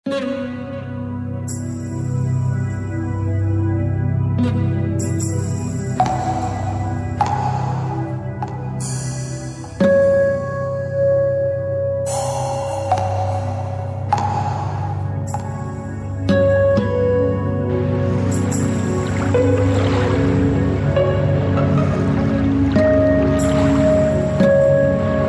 ambient with nature; promien slonca

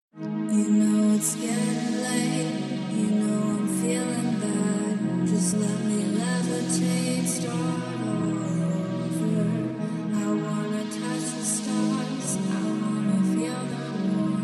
vocally 432 hz; longing for stars

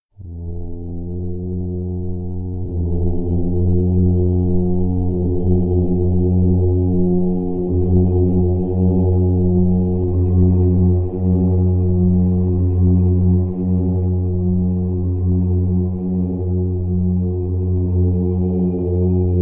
om crystal mantra 432 Hz; om udgatar; Nad brzegiem morza

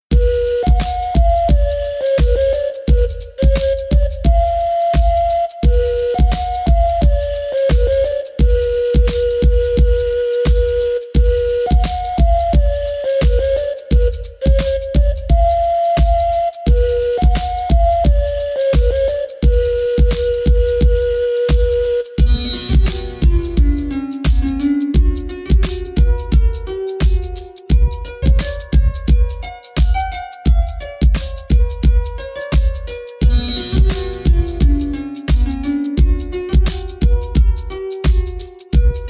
sensual rhythm 432 Hz

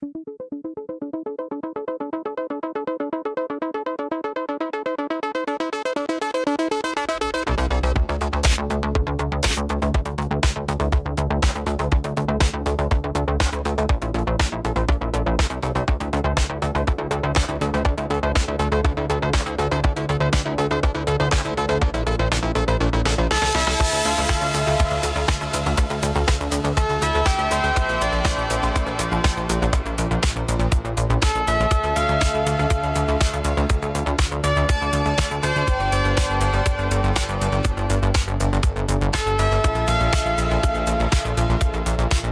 Electronic Impulse

